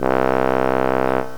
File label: Trombone; GMPlay